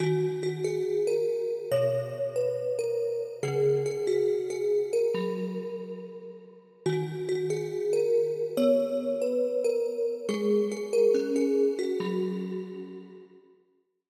Bell

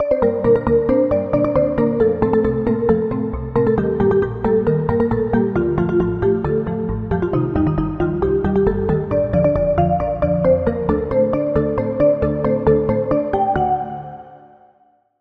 Key

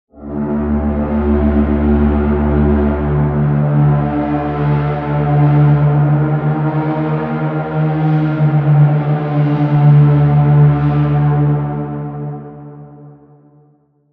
Pad

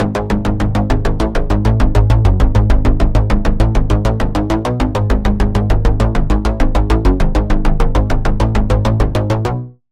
Pulse, Spire; Fourth Dimension; Routed Sound; Spire Expansion; Quantum Enforcer Vol.1

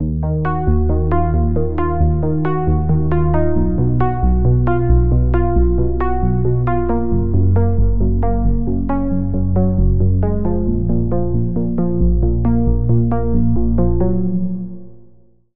Pluck; Routed Sound; Sylenth1 Expansion - Reality Maker Vol.1

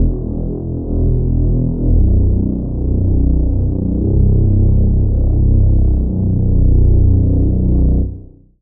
Reese; Bullet Zone; Routed Sound; Vital Expansion - Noble Gangster Vol.1

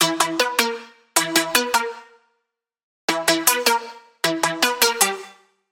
Pluck; Routed Sound; Spire Expansion - Chaos Bringer Vol.1

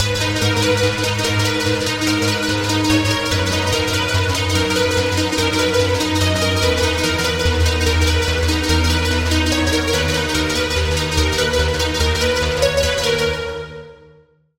Pluck, Serum 2; Pluck - Quantum Dance; Routed Sound; Serum 2 Expansion - Reality Maker Vol.1

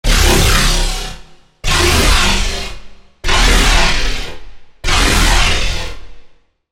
Growl; Growl - Bomb Eater; Routed Sound; Vital Expansion - Chaos Bringer Vol.1

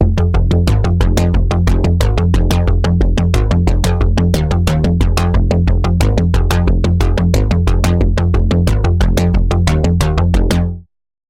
Pulse; Routed Sound; Spire Expansion - Quantum Enforcer Vol.1

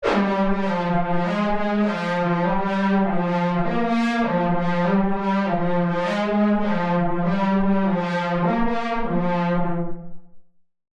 Lead; Routed Sound; Sylenth1 Expansion - Street Vanquisher Vol.1

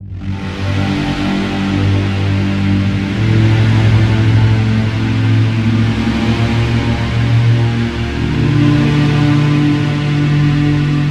Pad, Serum 2; Pad - Dimensional Blade; Routed Sound; Serum 2 Expansion - Quantum Enforcer Vol.1

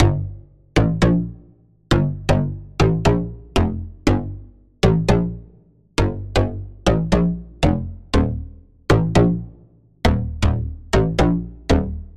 Bass; The Murderer; Routed Sound; Serum 2 Expansion - Noble Gangster Vol.1

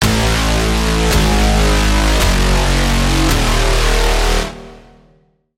Braam, Serum 2; Braam - Mechanical Underground; Routed Sound; Serum 2 Expansion - Quantum Enforcer Vol.1

